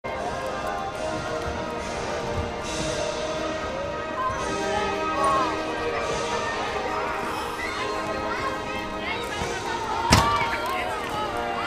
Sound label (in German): Atmo mit Hymne; Sternsinger Cup Augsburg Aktuell